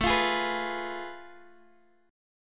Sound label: F Diminished A; Fdim/A strummed; JGuitar